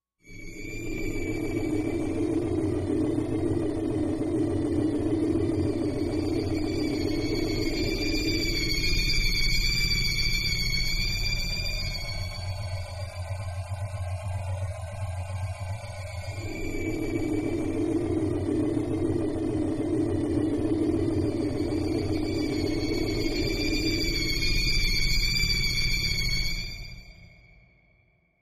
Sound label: High Tones Sw, eerie ambience